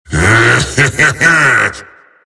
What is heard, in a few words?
laugh; tf; skeleton king